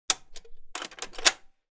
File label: gun maxim machine gun cock